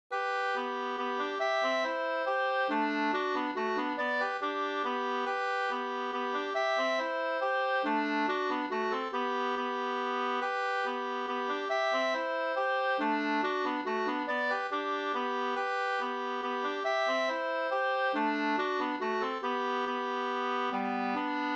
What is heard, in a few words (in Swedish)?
skanklat